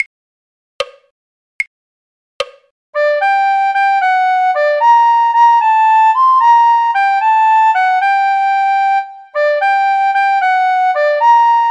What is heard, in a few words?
the miller of dee recorder; folk